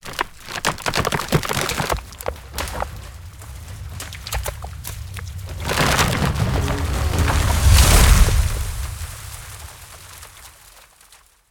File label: Large Tree Heavy Fall; Mechanical Wave; Lumberjack